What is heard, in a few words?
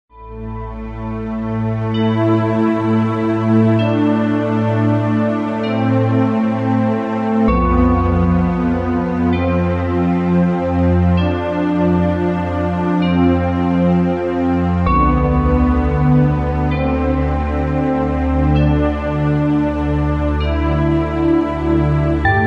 orchestra short